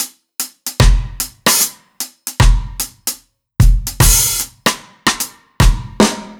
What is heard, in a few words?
Normal Kit B